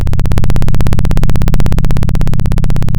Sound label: Sound of a plane taking off